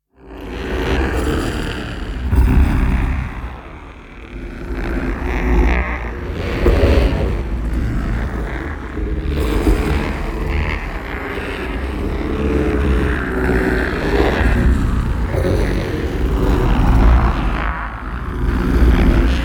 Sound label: NEON PARTICLES LARGE SLOW; Sunrise In Hell; boom library